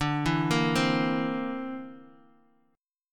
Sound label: D 7th Suspended 2nd Sharp 5th D, Bass Standard